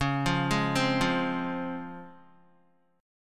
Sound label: C sharp Major 7th C sharp Guitar; C#M7 arpeggiated; JGuitar